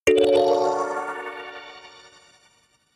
notification kalimba magical major

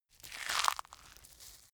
crunchy soft

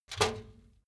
trash basket metal hit rattle high

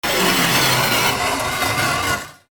scratch

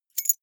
key ring small place

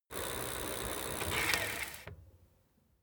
bicycle street brake slow ring

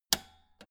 old button press jump out

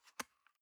paper box small open medium

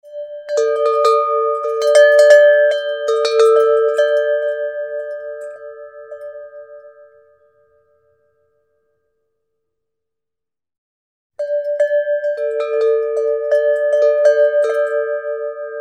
indian player bells

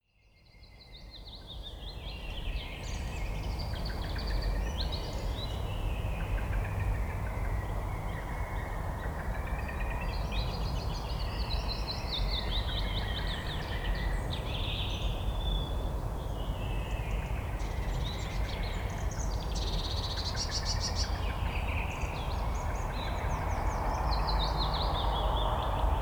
forest birds

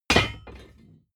plate armor piece drop

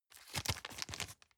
plastic bag small turn soft

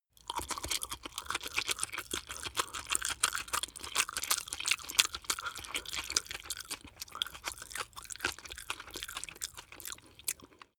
chew spaghetti smack fast

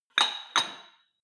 weight rack insert weight multiple short

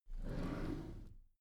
packed drawer smooth open soft halfway

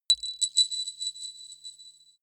glassy reverb

